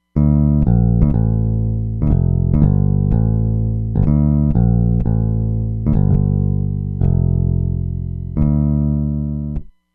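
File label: Picked Bass, Roland Fantom XR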